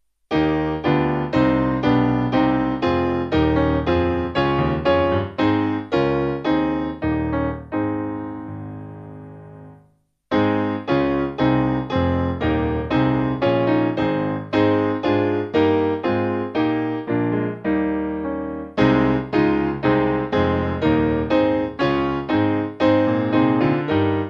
To the Name Piano; Oriel

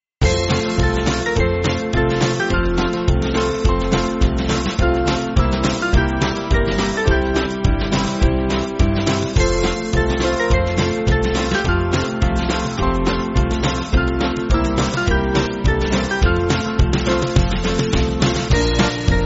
Band; Come With Thy Sins

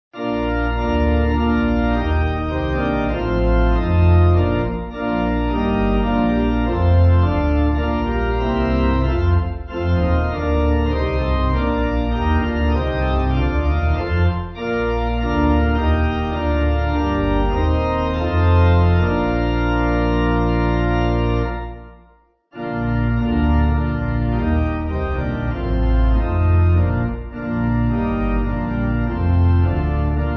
Winchester New Pipe; Before The Lords

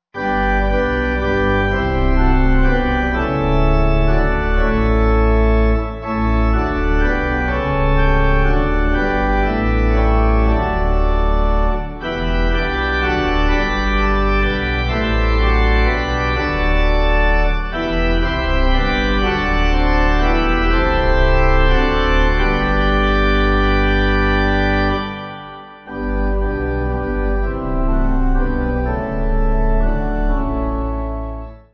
Renew Me O Eternal, Pipe; Herr Jesu

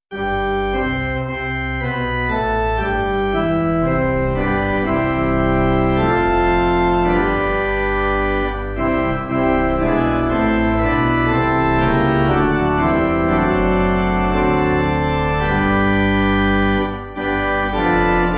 Pipe; My God I Thank Thee

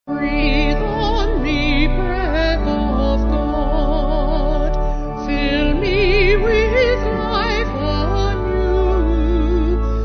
Vocal Organ

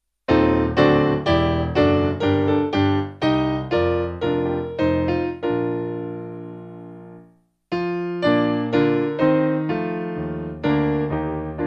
O Praise Ye The Lord Piano

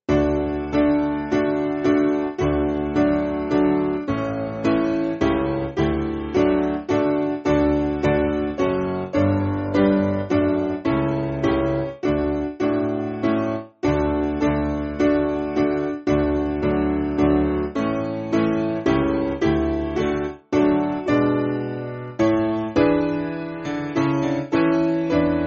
Piano, church music

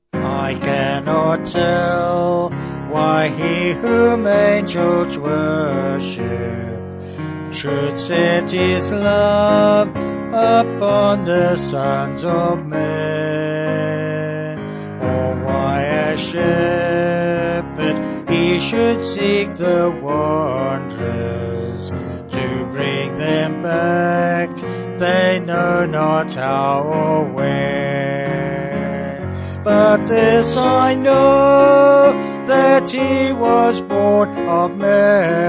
Vocals Piano; I Cannot Tell; Londonderry

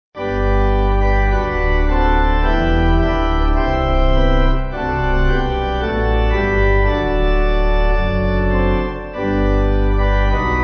When The Weary, Intercession, Pipe

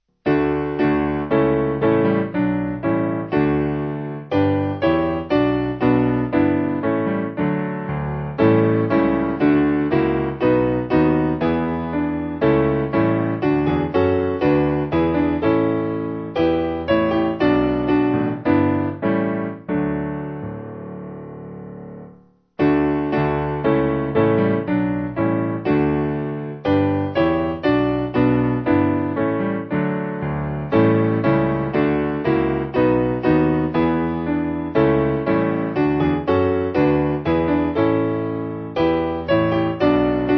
Nassau Piano; Christ The Lord; Christ, the Lord, is risen

